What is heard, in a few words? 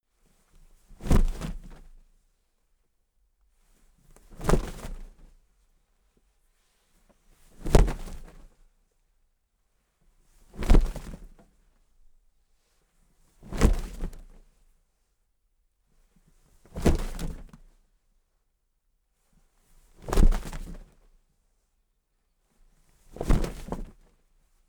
wings flap